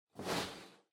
Loose Fill Packaging Far Rustle; 梱包材

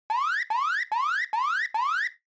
Warning Siren High Mid

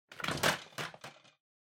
Vacuum Cleaner Pick Up; 掃除機; 持ち上げる

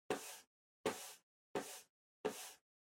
PC Mouse Move Far Soft Pad Hit

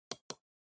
PC Mouse C

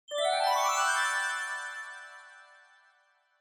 Scene Change High Up

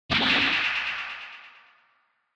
Retro Anime Robot High Long

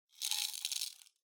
Spice Mill Salt Refill

